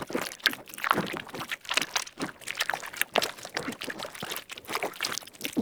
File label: Goop Slime Fall; Ambience; Props; Warframe; Infested Eris